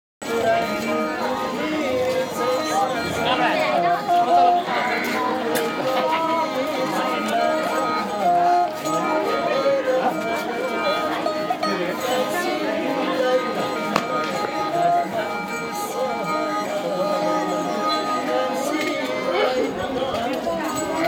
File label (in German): Boudha Strassenmusiker; Boudha, Kathmandu, Nepal